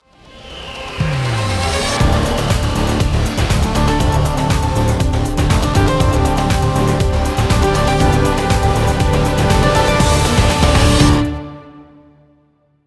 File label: Countdown, Music; Game Start